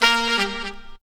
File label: BRAZ LICK, BRASS LICKS